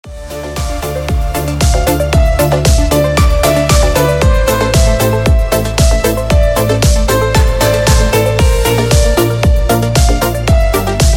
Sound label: instrumental